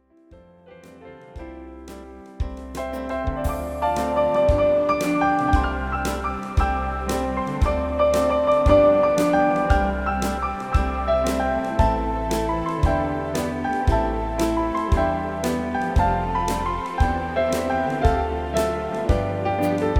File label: foxtrot